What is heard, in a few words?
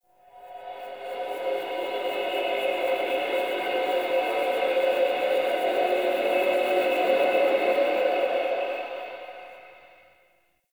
Crypt Cymbal Swell G; Ambience; One Offs; Champion Crypt